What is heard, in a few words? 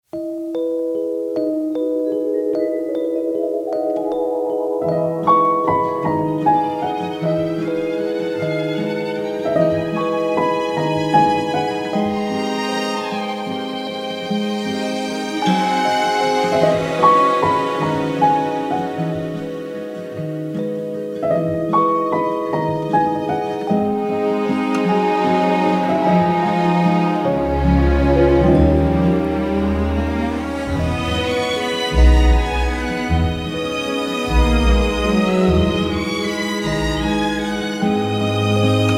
Score Orchestral Vintage French Theme; Ottilie; TV Eventmovie Pitch